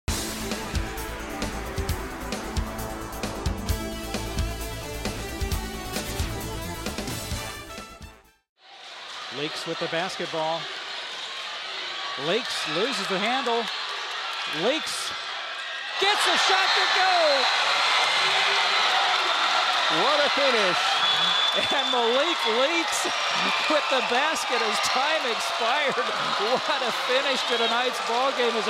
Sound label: buzzer beater for North Salem High School